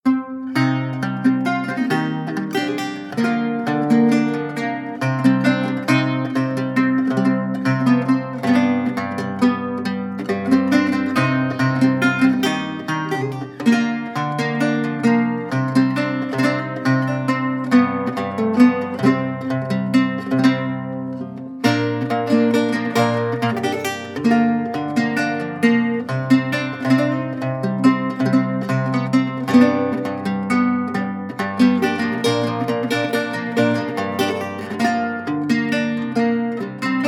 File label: lute 7 renaissance traditional sequence; loops